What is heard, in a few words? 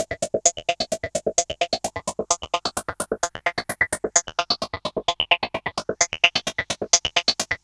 EMS LOOP; Syntec; Wall of Sounds; TECHNO-BOX